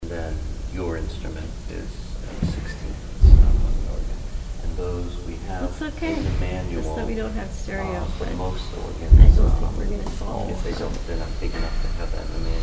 organ

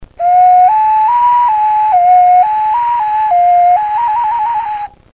ocarina

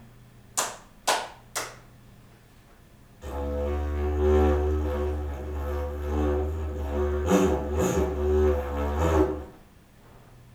digifu room

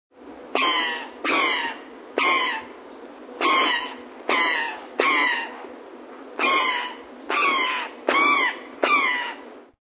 Brown Jay, BIRDS; Ascal; phy103

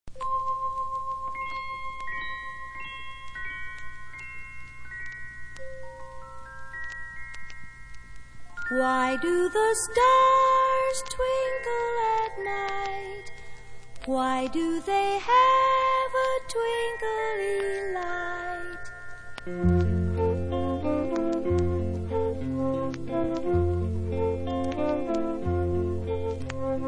twinkle

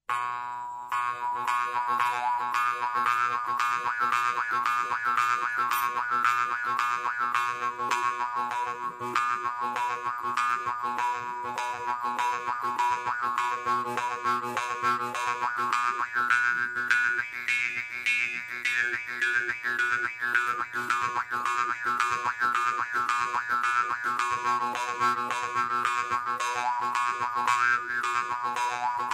jew harp

